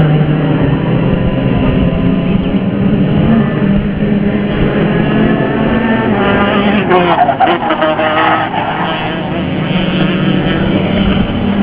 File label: kart sound